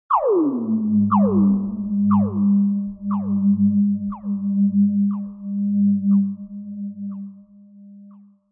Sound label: Dly Beep Mid, Repeating high dives; Delayed Beep Dives; Halloween Sound Effects; Halloween (Sound Effects:Seasonal)